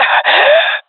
death, swat; vo; Counter Strike Model Pack; CS:GO; swat epic

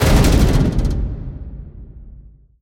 helicopter rattle impact; scripted sequences; ending

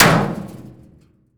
bullet metal barrel; physics

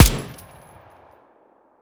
negev, weapons; Counter Strike Model Pack; CS2

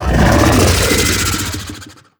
idle out, barnacle; Half Life Alyx

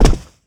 land carpet, footsteps; player

